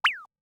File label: Gopher; Cowboy; JotPK